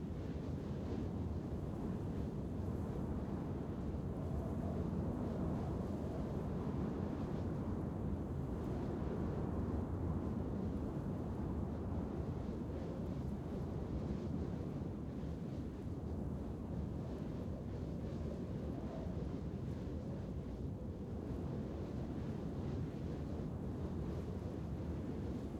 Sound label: Snow INT LOOP